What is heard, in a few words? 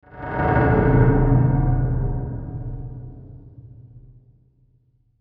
Chopper Dark Low Reverb; Blastwave; Chopper Sound Effects